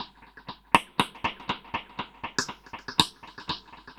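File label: Drums Snare Echo 120, dub drums samples, 120bpm; musicradar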